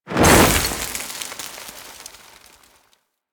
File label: Fire Death Burst; Warframe